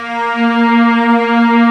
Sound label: Textural Strings; E-MU Producer Series Vol. 2 – More Studio Essentials; Composer